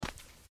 biped walk

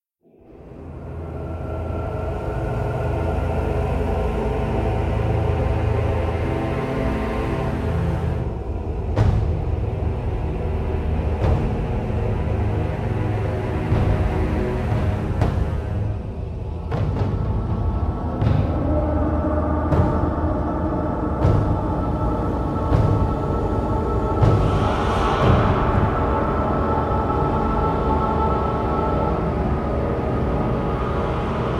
music rotted ambient

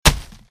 impact blunt

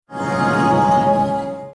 healing breeze effect

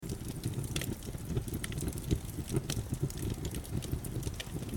crackling fire loop

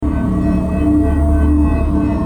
portal bind warmup

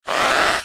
chillkin attack; mobs

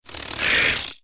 giant mosquito attack; mobs

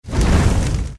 firebolt impact

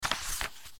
quest appear

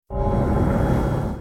mystical effect

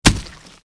impact blunt

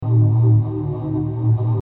soul needles projectile

